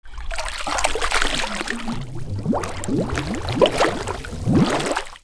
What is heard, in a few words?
WATER